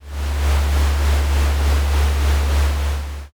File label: conveyor